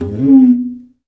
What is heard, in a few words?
PRC CNGA, AFRICAN PERC; Roland LA